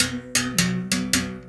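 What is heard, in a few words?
BS BERIM GRV, BERIMBAU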